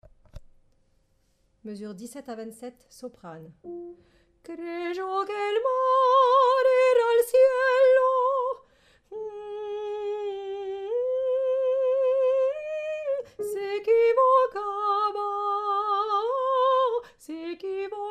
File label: paloma Soprano